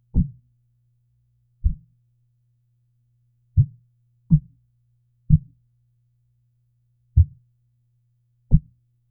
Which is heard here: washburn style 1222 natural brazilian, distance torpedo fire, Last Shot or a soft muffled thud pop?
a soft muffled thud pop